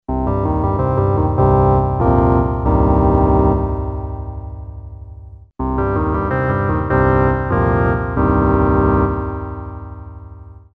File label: organ; CZ-1